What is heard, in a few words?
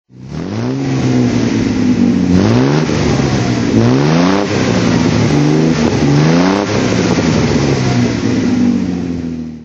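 austin healey 3000 mk2 throttle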